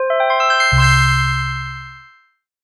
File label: mega win